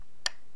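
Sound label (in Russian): щелчок выключателя настольной лампы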